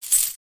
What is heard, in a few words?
Handling coins quarters